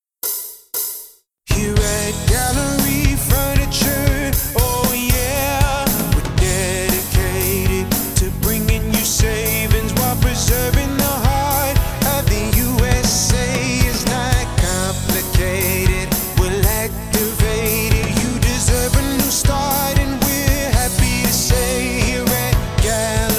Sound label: music harmony karaoke; Andy's Hawg Wild